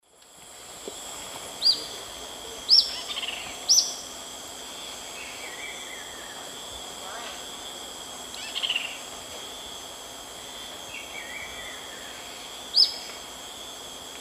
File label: Tolmomyias viridiceps, song and calls; S. America; Eastern Lowlands